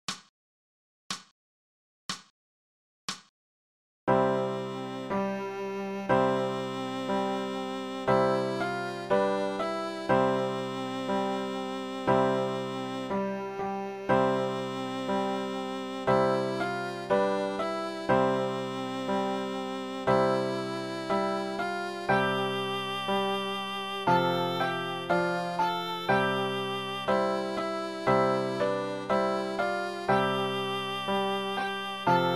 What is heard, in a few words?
Ding dong, viola